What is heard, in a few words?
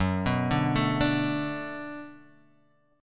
B Minor 7th F sharp, chord